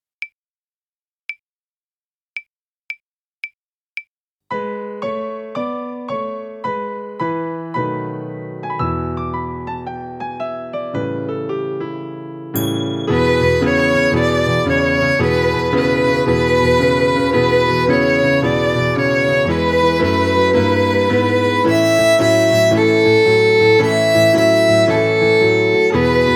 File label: blues Violon